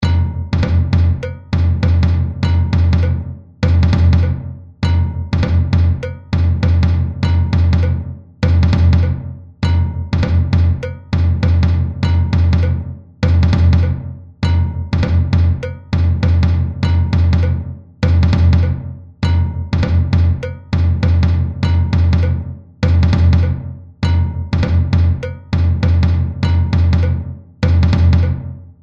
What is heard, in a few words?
Batidao Breakdown Surdo, samba